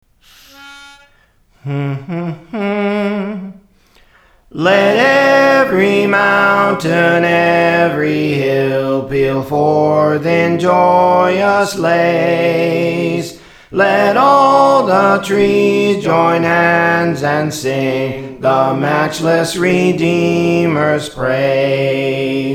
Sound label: Soprano, Praise For The Lord Training